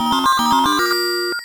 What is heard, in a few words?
Power Up!